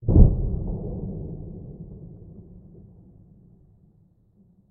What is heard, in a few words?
Weather Thunder Single Rumble; Frogsong